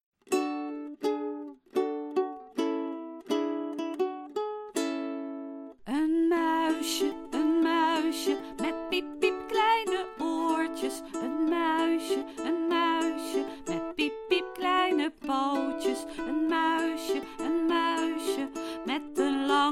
Muisje Miep met zang

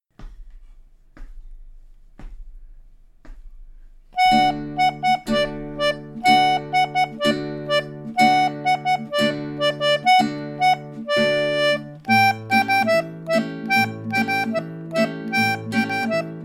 zonder zang

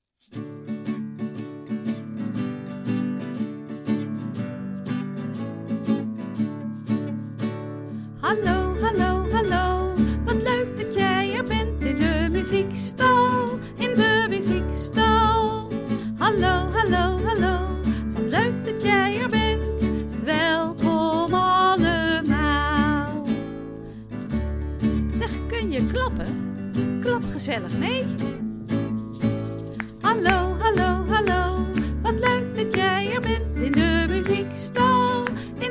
welkom in de muziekstal met zang

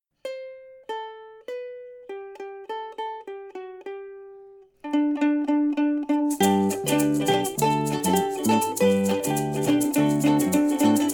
zonder zang met shaker

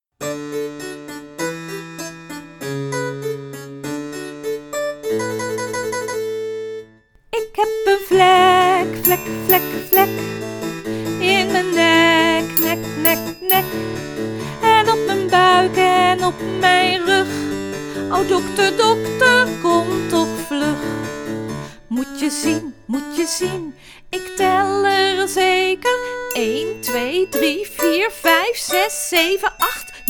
ik heb een vlek met zang

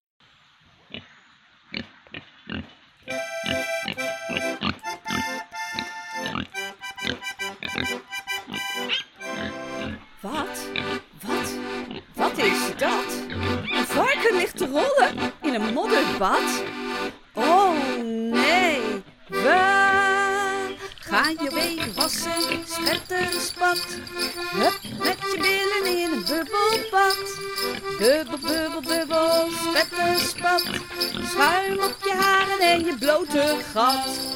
met zang

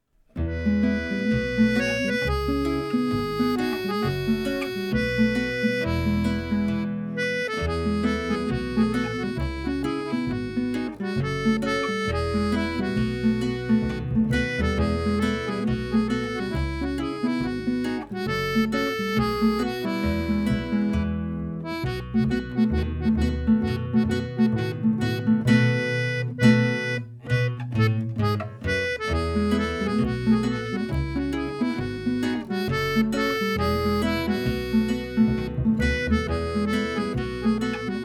zonder instr

